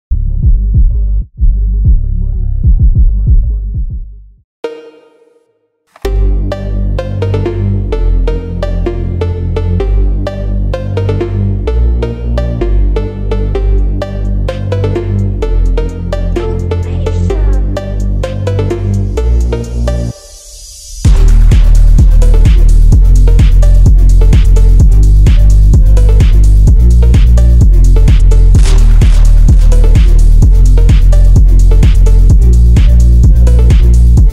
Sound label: phonk